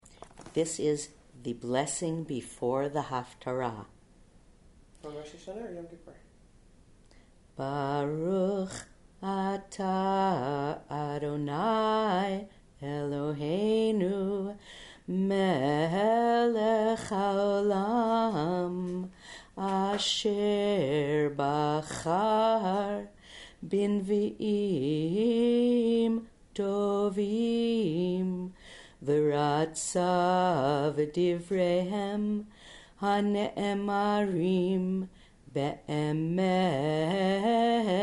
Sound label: blessing before haftarah; jewish holidays